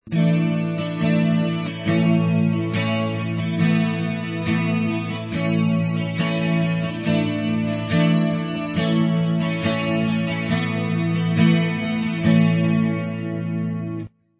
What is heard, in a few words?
Asus A A2 A, guitar